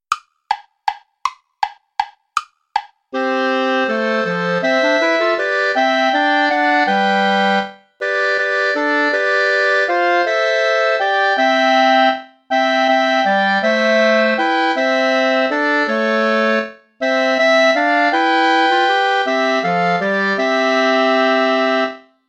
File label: play along without clarinet; vulpius melchior; die helle sonn leucht jetzt herfa